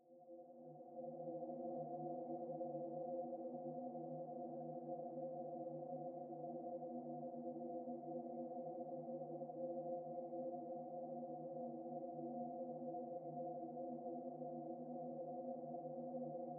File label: Dissolve